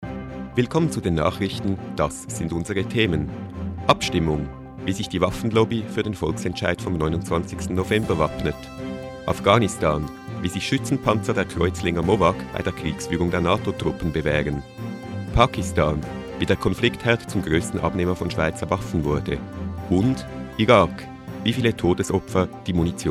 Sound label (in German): Jingle lang